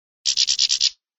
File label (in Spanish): pedorreta; campero